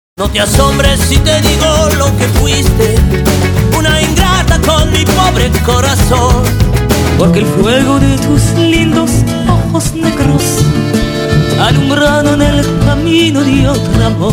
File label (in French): Chorale